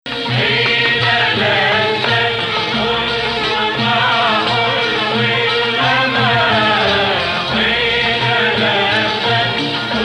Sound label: Sikah Jadaka 2nd Rast